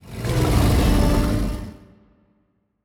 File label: kaiju enrage; biogenesis